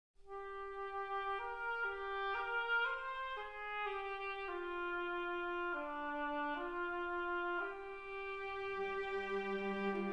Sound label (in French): Rhapsodie pour La Harpe